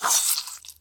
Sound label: whack